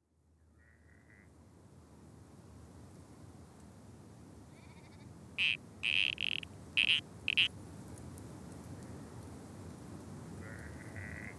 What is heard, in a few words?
Spotted Grass Frog, Australia; The Wired Lab; Cootamuntra